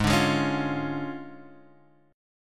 D Minor Major 7th Flat 5th, G sharp

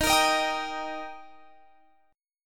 D sharp Major D sharp Guitar Open F, chord; strummed; x,22,22,22,22,22; JGuitar

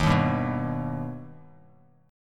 Eb Augmented Eb, Bass Standard; strummed; JGuitar

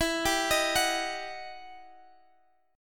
E Minor Major 9th E down Guitar Standard; EmM9; arpeggiated; JGuitar

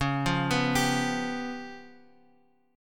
Db 7th Flat 5th, Bass Standard; Db7b5 arpeggiated; JGuitar

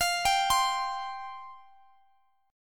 F Suspended 2nd F Guitar Open G

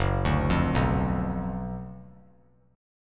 F sharp Major 7th Suspended 4th Sharp 5th; 6,9,8,9; 3C,3F,3A,3D; arpeggiated

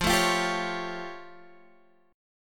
A Augmented 7th F

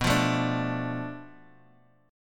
B-Minor 7th Flat 5th B down Guitar Open G

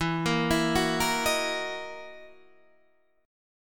F sharp 13th E

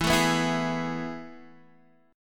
A Major 7th Suspended 2nd

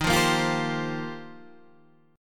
F 9th Suspended 4th Eb, chord

